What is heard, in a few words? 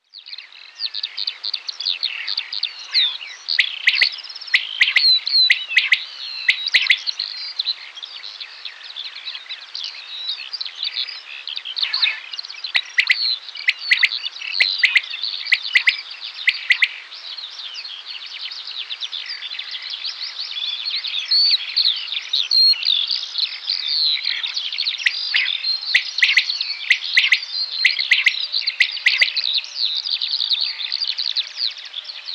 Coturnix coturnix